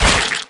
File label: parasite burrow; garrysmod